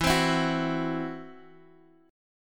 E Suspended 2nd Sharp 5th E, Guitar, Half Step Down